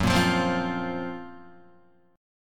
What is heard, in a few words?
F sharp Major Sharp 11th F sharp, Guitar; strummed; JGuitar